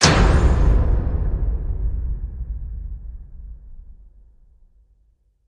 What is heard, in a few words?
Hit Big Fusillade